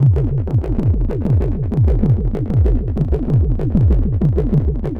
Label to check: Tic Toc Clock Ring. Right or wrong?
Wrong